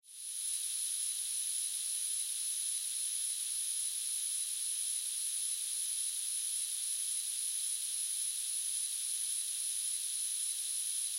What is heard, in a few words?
Industry Air Leak Gas Small; Air Pumps (Sound Effects:Machines)